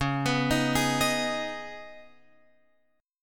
Db 7th Suspended 2nd, Guitar, Open F, Db7sus2 chord; JGuitar